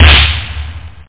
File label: drums snare, nolong; OctaMED SoundStudio V1